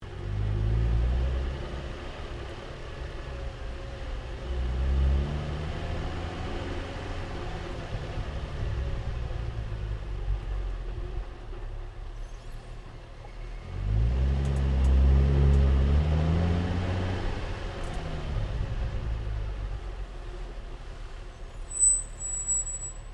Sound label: CAR NISSAN 350Z EXHAUST MANOUVER SLOW, manual sportscar